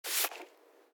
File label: HAPTIC LOCK WALL HOVER; Sharp AQUOS sense lite SH-M05; sharp audio